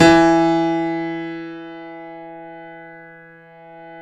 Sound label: KEY, YC7; Roland L-CD701